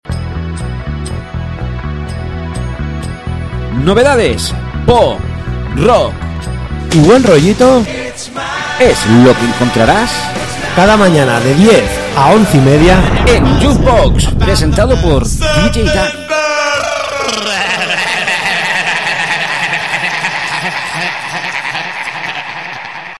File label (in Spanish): cuña juck box rock; juke box; cuñas radio